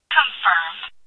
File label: CONFIRMED, gamebuzzer; sloodle; gaming